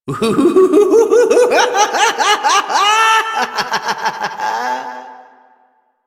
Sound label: Laughter